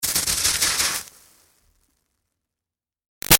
Sizzle Iron Electrical Zap; Electronics

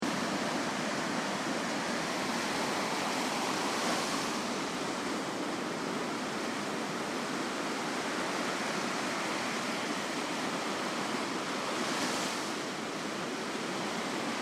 Ocean Beach Sound Of The Sea Flood; Nature; Crafted Signals

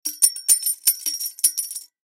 Coins Glass Jar Drop In Multiple; Coins Glass Jar Drop In Slow Multiple; Foley; Blastwave

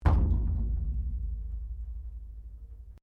METAL THUMP QUIET

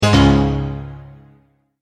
multimedia tone error; Tones; Sound Design

